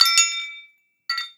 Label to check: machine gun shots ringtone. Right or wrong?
Wrong